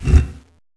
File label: nether brick fence gate close; fences; Minetest; VoxeLibre